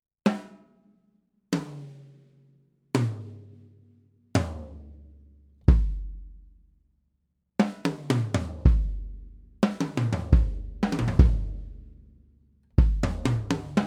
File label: Sonor Designer Blue Sparkle